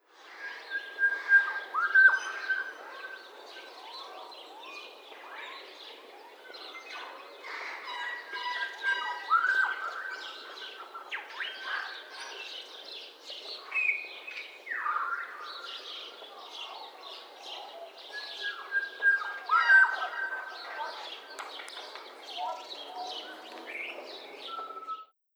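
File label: Yosem Birds, Yosemite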